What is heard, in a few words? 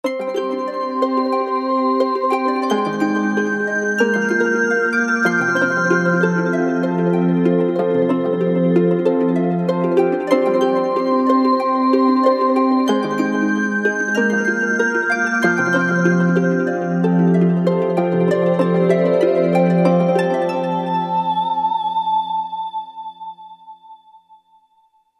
Ethereal Harp